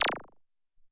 Sci Fi

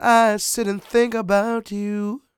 SING W, Vocal Planet Blues & Soul, BLUESY; ILIO

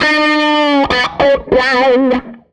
Tele Guitar Loop

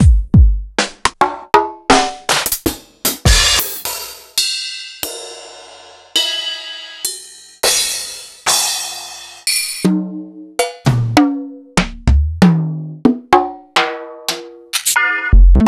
Drum Bass, proteusvx

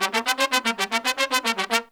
Pop & Funk Brass, L

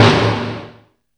91 DRM SND, Pure Electro; USB Soundscan vol.03; AKAI

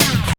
RAVE STOP; Spectrasonic Distorted Reality 1

